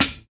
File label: 70s sparkly reggae snare single hit a key, Royality free steel snare drum sound